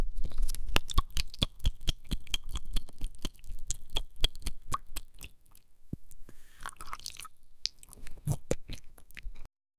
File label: plucking fruit